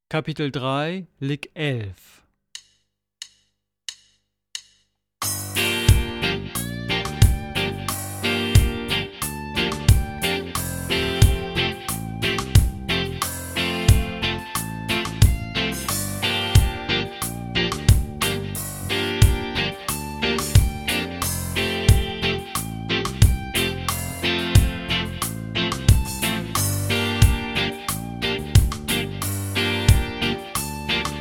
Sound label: Latin Style Guitar